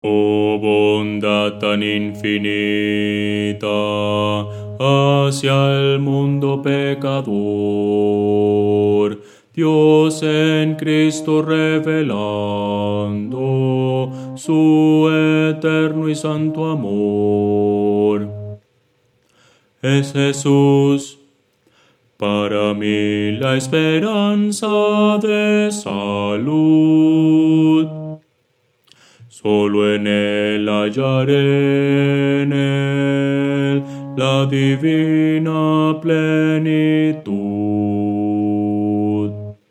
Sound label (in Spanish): bajo